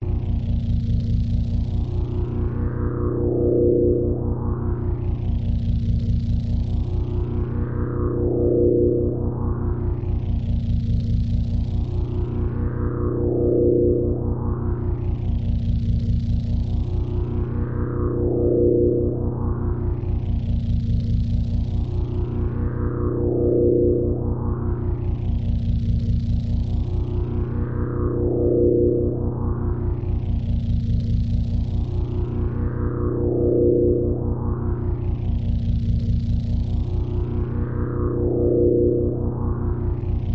drone loop buzz pulse slow rhythmic flutter rattle thin resonant design; energy field; skyclad sound